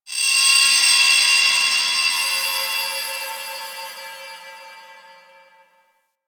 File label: Moon Texture Medium, Ambience; Warframe; Orokin Moon